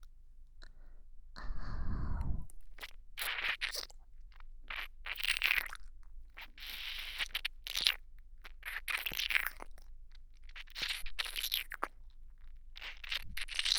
耳キッスコース

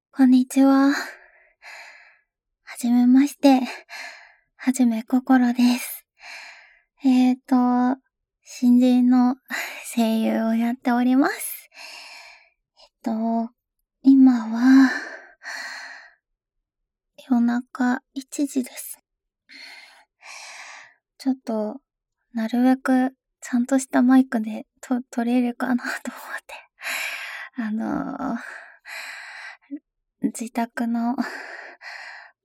おしっこ実演 ギリギリまで我慢してバケツにおしっこ

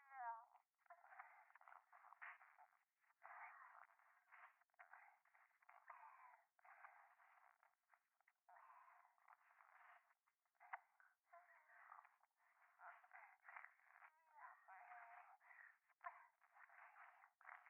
電話 フェラチオ

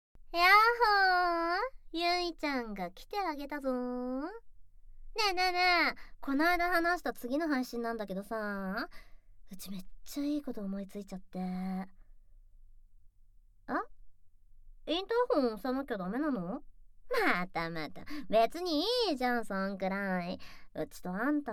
耳舐め誘惑, ASMR; 効果音あり; ゲームよりエロ優先しちゃお？; 24時間ガチハメ配信企画

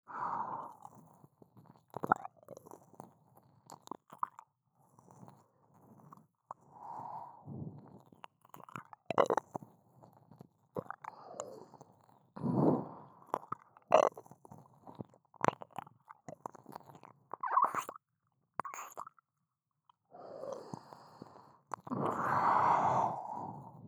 右耳舐め, ASMR

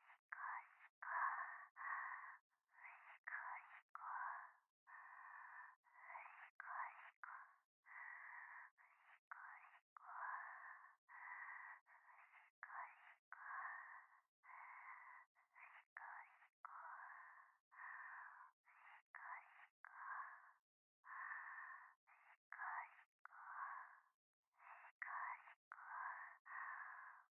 しこしこ

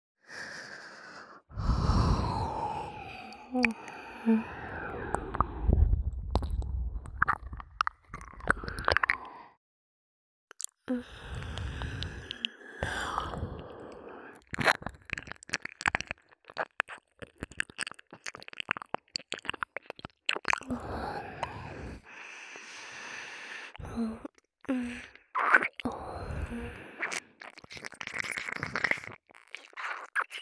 耳舐めのみ

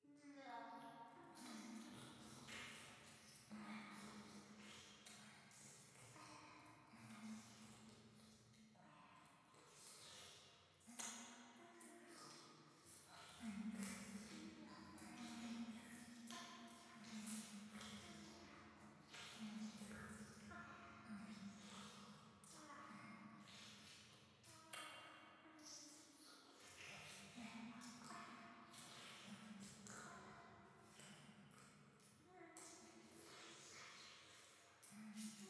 教会 フェラチオ

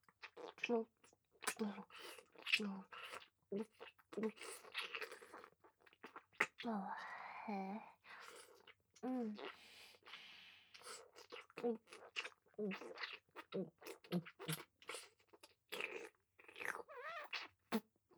フェラ 激しめ

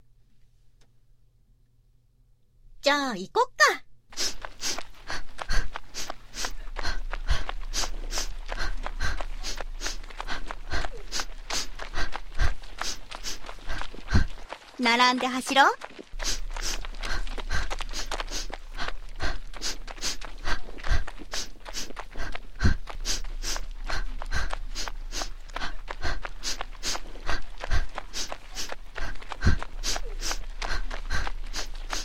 ランニング

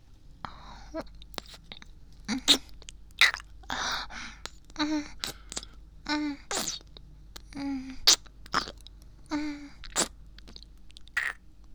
フェラ音(喘ぎ声をあげながら); 10秒間

